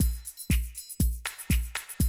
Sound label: 120, Classic Drum Loops